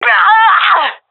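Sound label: death, female; vo; Counter Strike Model Pack; CS:GO; seal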